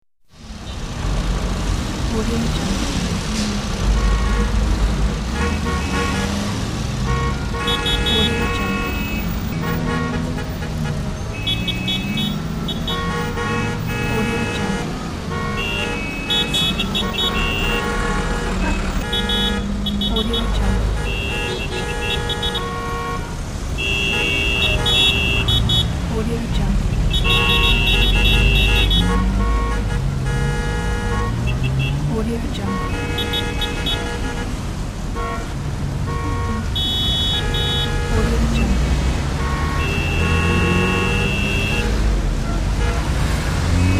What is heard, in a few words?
city traffic